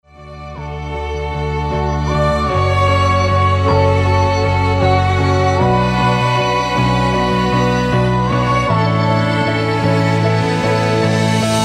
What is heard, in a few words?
String Arrangment Piano Organ Synth Mellotron Percussion